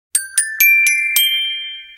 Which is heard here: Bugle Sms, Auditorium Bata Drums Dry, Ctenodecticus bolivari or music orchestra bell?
music orchestra bell